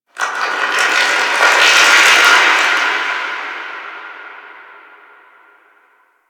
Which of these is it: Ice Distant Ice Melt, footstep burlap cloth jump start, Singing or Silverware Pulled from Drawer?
Ice Distant Ice Melt